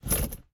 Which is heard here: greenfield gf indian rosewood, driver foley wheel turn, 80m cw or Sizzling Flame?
driver foley wheel turn